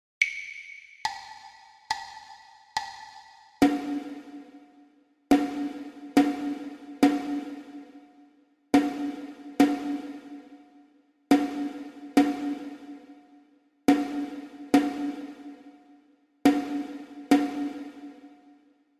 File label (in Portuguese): percepcao ditado ritmico